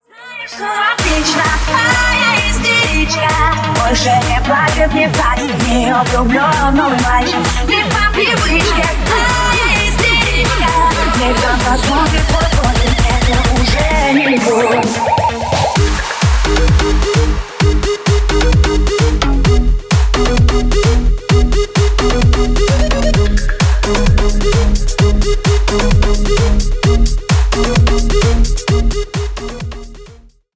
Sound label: drummix remix